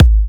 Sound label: G#, kicks; markus hakala kicks